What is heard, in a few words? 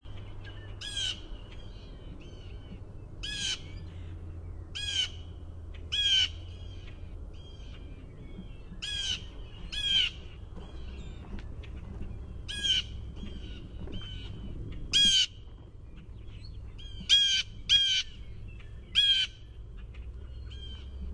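Blue Jay jeer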